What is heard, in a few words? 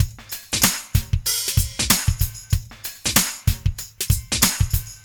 Smooth, R&B Drums